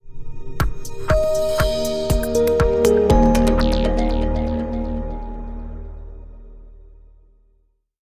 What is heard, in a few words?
Sonic Sparkle